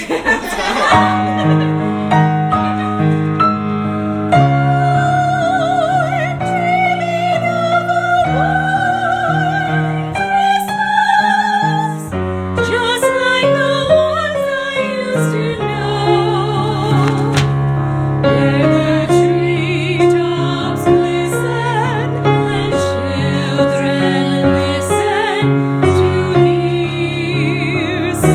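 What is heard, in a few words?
soprano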